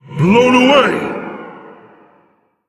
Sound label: Female Elemental Attack